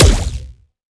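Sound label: Sci weapon Shot Small Blaster Anti Matter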